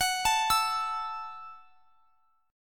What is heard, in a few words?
F sharp Minor 7th, guitar; F#m7 arpeggiated; x,x,x,23,22,24